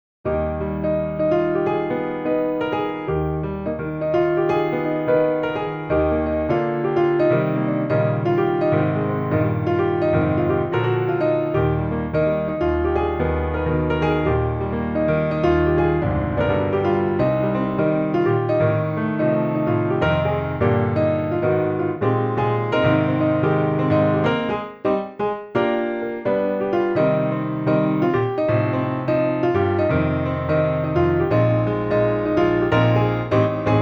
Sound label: jazz piano